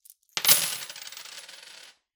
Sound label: Coins Thrown On Counter